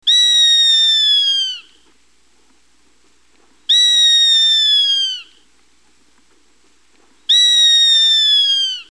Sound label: Short tailed Hawk, songs